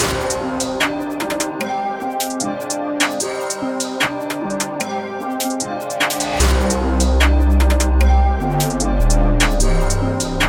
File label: drill